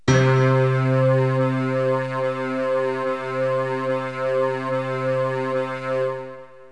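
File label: string piano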